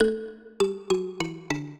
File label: minuet, mbira; Mozart